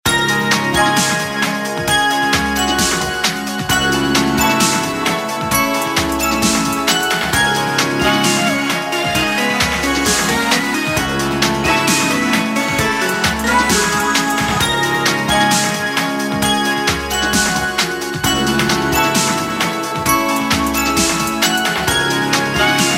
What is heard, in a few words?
Euro Dance, Ringtones